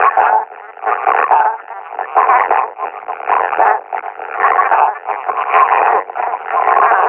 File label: panting, combine officer; Half Life Alyx